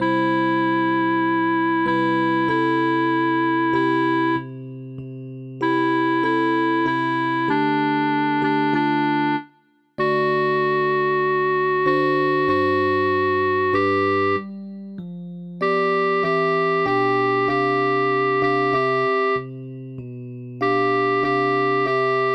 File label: Contralto, midis; Bruckner; Locus Iste; Seminario de Canto Coral; Lluís Vilamajó